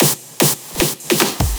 Bad drum fill; Drums; Fills Rolls; Missterious

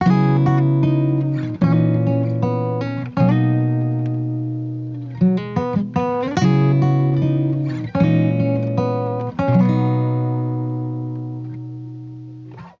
Acoustic Guitar Loop Dry 75 BPM B Maj; Melodics; Cymatics; Eternity Sample Pack; Guitars - Acoustic

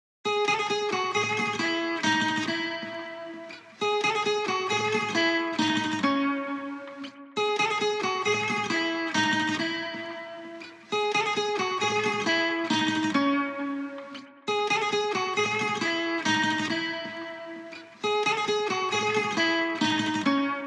135 BPM C# Min Acoustic Guitar; Cymatics; Miami Guitar Pack - Pt 2; Mania

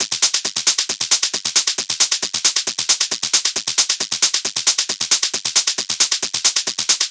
Hat Loop 135, Techno

